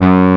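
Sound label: horn G1, synthesizer analog horn; AKAI S6000 CD-ROM - Volume 1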